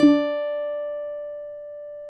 cuatro; Spectrasonics; Hans Zimmer Guitars VOL-2

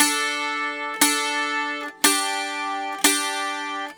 Celtic Flavours; USB Soundscan vol.42; AKAI

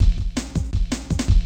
WE LOOP, Yamaha DTXpress-II

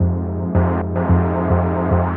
110 Fm Drumma, Melodic Loops; Electronisounds; DeepBukitDrumma

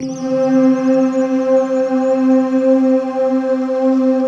RAIN, Rainstick, Rain Forest; Optical Media International; Sonic Images Library

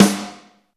AMB SNARE; East West - Phat & Phunky

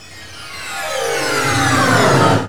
ORCH; MELODICS

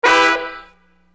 Horn Hit, Hip-Hop; Construction Kit; Sonic Foundry (Sony Creative Software)

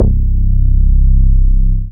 BAS MINI, Mini-Moog

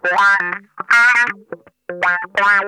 TIMER, Funk Guitar; Zero G - Funk Guitar

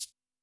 SHAKE, Yamaha SY85 Drums

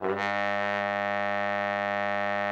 TROMBONE, Killer Horns; Best Service

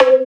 synth note C; Virtual Riot

